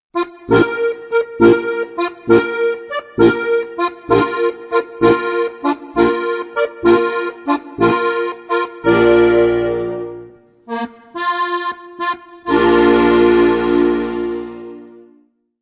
hasen tot signal; Halali

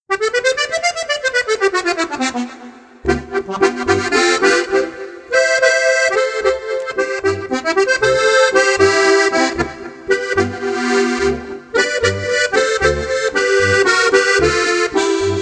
laendler

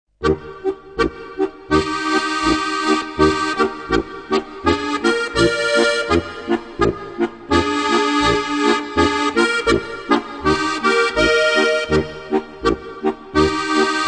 polka; Volksmusik- leicht gemacht

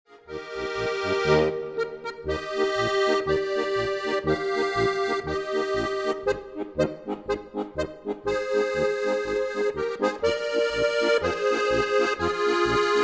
polka; Sauguat

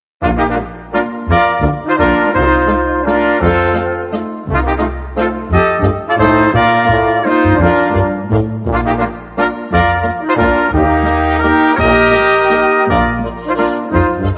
walzer

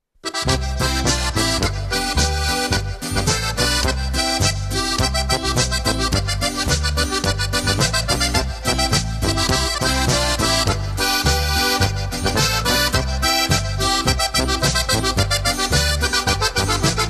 boarischer

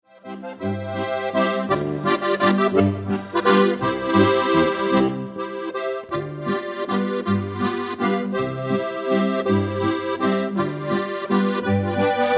Walzer

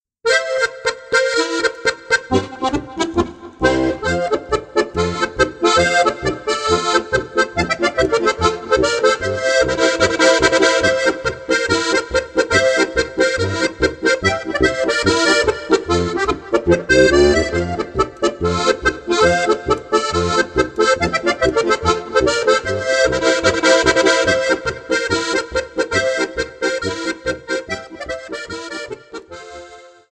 polka

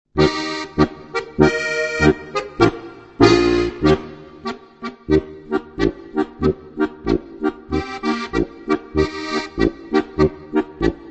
marsch; Leicht & Guat - 15 leichte Märsche

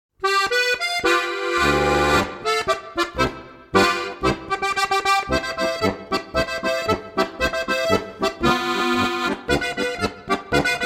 trompetenecho

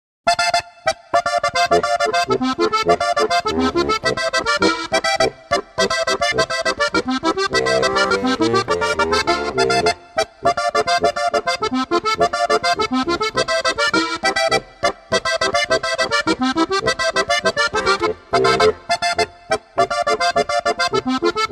boarisch

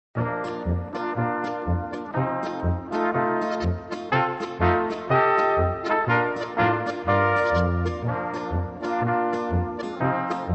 Pfeifferl Polka

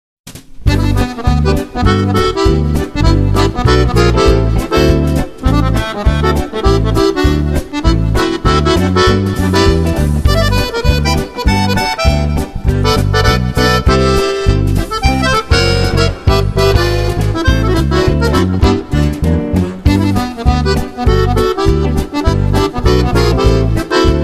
mit combo

